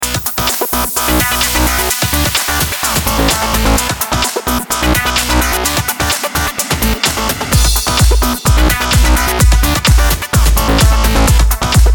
Synth Shaping Dry; Mattertone; synth shaping with Mattertone

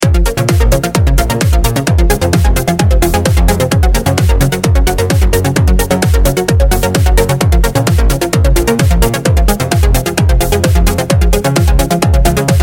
PolyJam Synth Arp WET

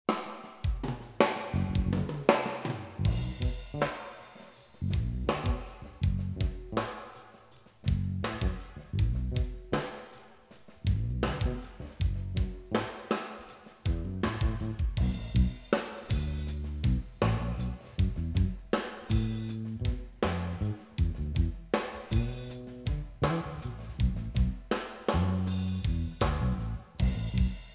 Drum Mix Wet; Verbtone

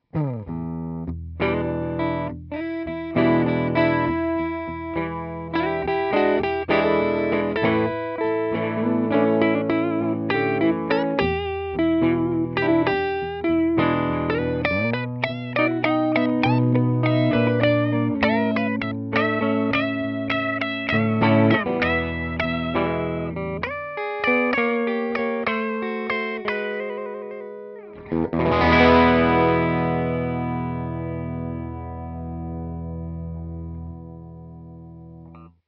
Blues Deluxe1, guitar; ReTube Custom Shop

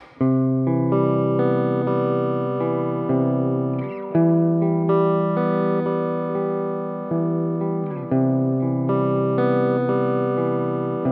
Deluxe1, guitar; ReTube Custom Shop; sound presets for guitar

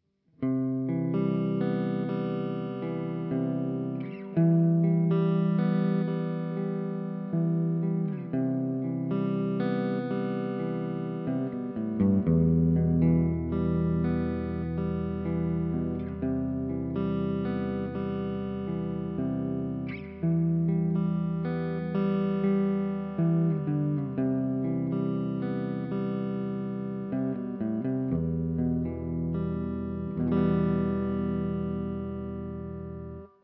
TwinR Reverb, guitar; ReTube Custom Shop

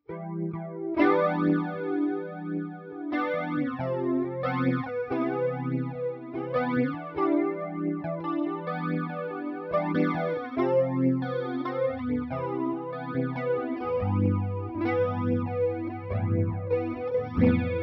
GTR; DComp is a digital re-creation of a classic guitar compressor pedal

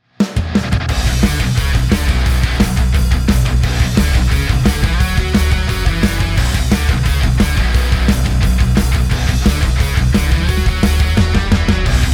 Metal Drums DRY; Grasso

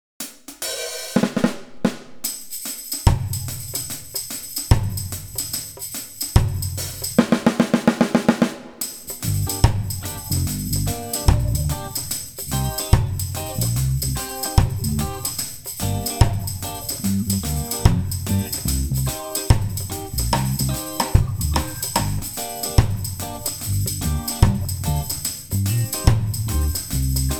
mix, drum-sets

